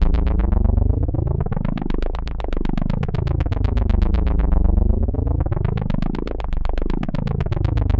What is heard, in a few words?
Bass Line; Eclectic Electronica